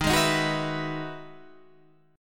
E Major 9th D sharp, chord

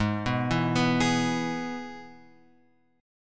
G sharp Minor Sharp 5th, guitar; G#m#5; {4 2 2 4 X 4} arpeggiated

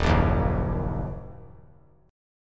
Eb Minor Eb 3C 3F 3A 3D; Ebm strummed; JGuitar

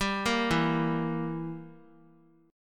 E Diminished down; Edim arpeggiated; JGuitar

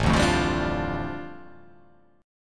A 7th Suspended 4th Sharp 5th, A, down

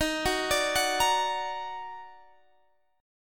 Eb Minor Major 7th Eb down Guitar Standard, chord; EbmM7; arpeggiated; JGuitar

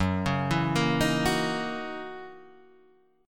Gb 13th Gb down Guitar Half Step Down

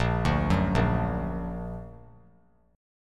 A sharp Augmented; A#+ arpeggiated; JGuitar

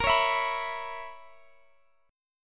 B Major 7th Suspended 2nd Suspended 4th A sharp

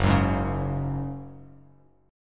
F sharp Suspended 2nd, Bass Standard; strummed; JGuitar

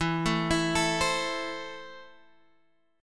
E Suspended 4th Sharp 5th

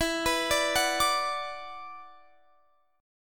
E 7th Suspended 2nd, chord

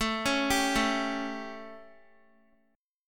A 7th Guitar, chord; arpeggiated

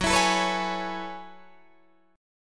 Gb Minor 11th Gb Guitar DADGAD

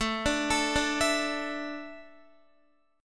A Suspended 4th, Guitar; arpeggiated; JGuitar

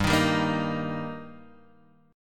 G Minor Sharp 5th G, chord; strummed; JGuitar

